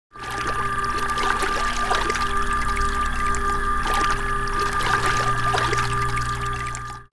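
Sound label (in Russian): медицинский насос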